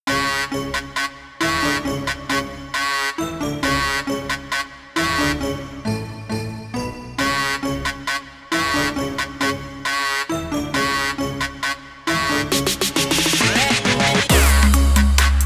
trap; By Burak Sound Bank; mixed music show vol 2

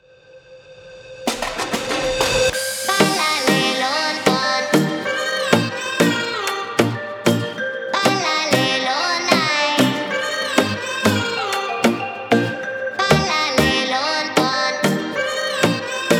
reggaeton; By Burak Sound Bank; reggaeton tema peketleri vol 1

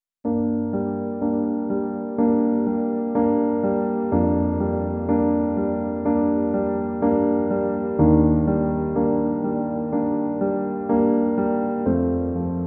dramatic; By Burak Sound Bank

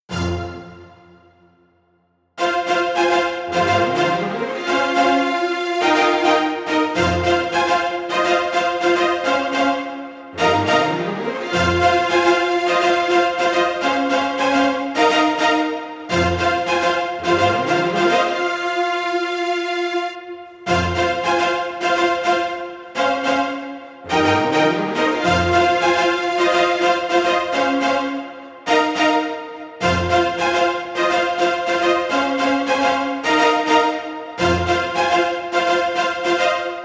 80s strings comp; gerilim; By Burak Sound Bank; tension vol 53

